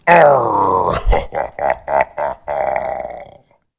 nade; JACQuakeSounds

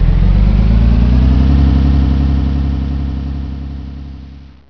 outro truck; svencoop

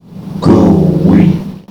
Ghoul Win; cstrike

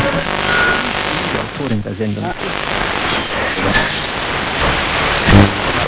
tune; cheesehmaps

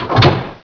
rail stop; dmc

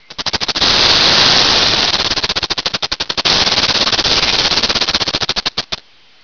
snake

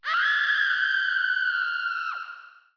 scream

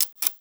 camera slide pull, weapons; cstrike

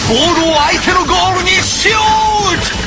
shoot; gamesaba

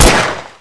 gun, weapons; cstrike

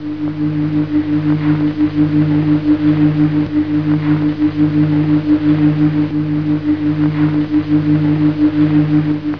Old humm

